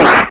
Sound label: Scream